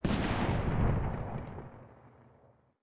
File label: firework; War Thunder